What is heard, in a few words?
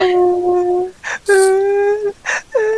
ant cry